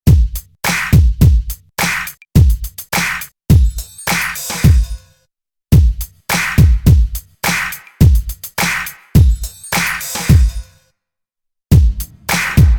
XR20 Reverb

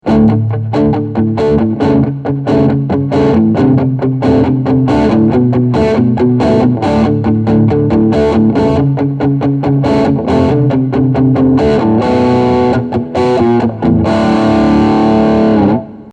Trem OD; TremLord

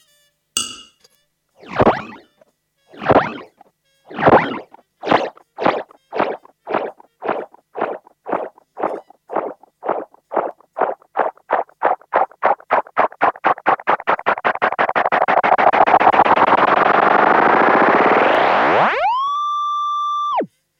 Delay Sensitive

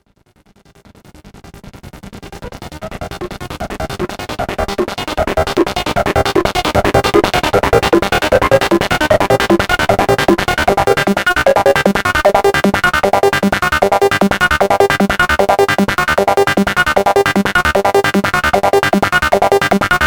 LFOs Dual Filter LFO high Speed

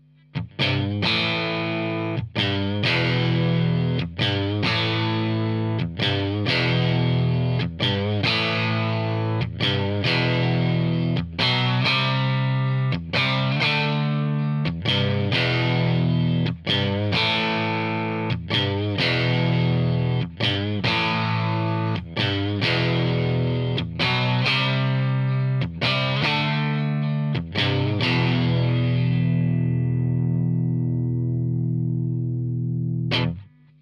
Strat rock

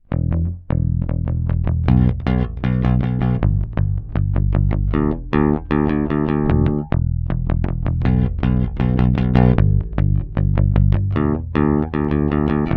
bass alpha 10kOhm

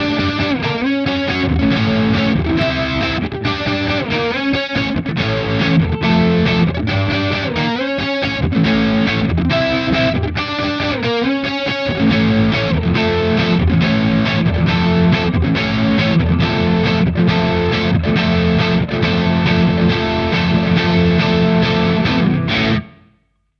Harley Benton bridge crunch

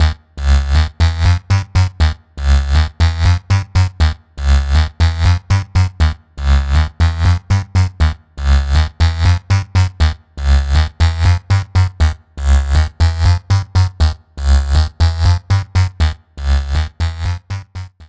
Derpstep

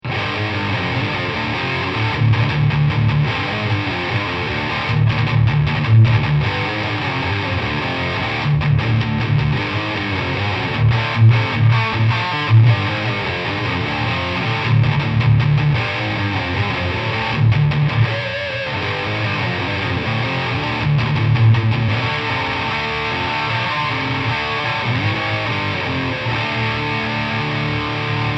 Amp 1 Channel A

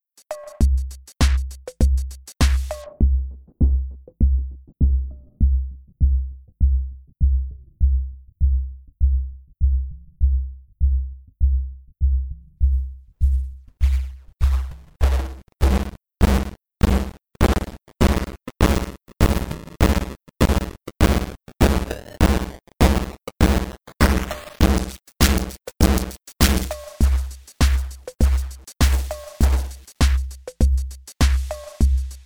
LoFi Sample Rate Sample Size Anti Alias

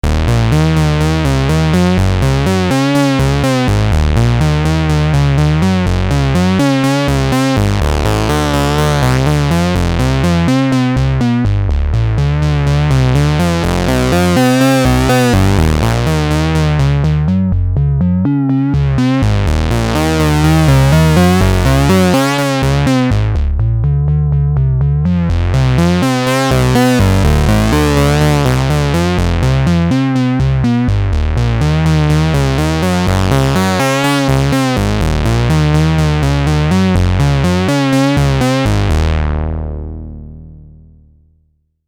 Subsequent 37 Simple Saws

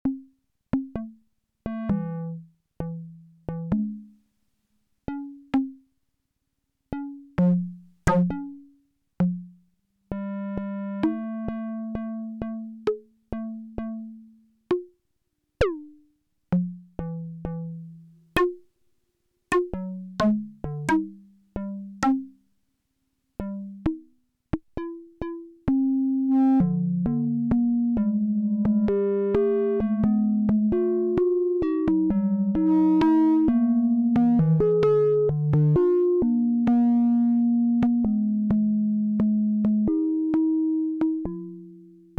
Melody

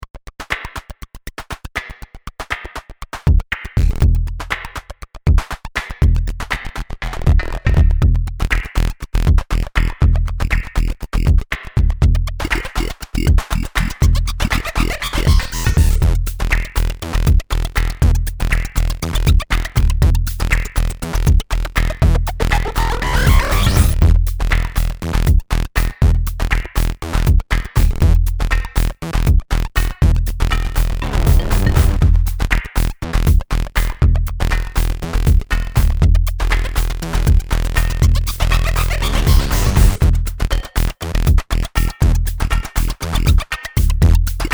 Virus TI2

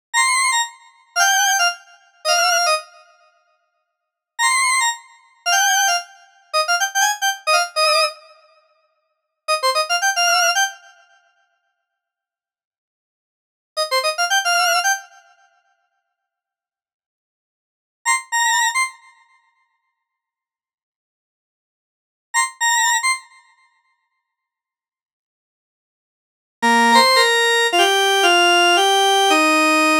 Neon Drama Synth

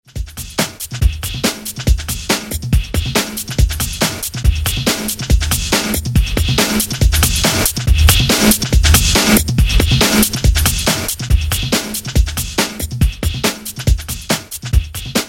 sustain

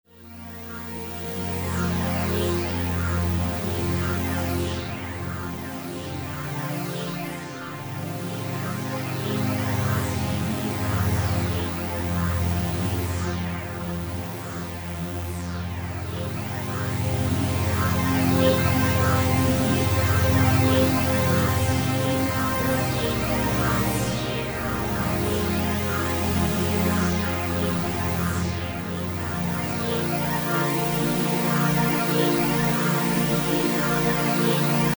Largo Drive LFO

